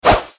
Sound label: swing sword